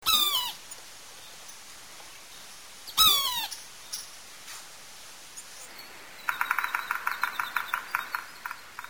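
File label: yellow-bellied sapsucker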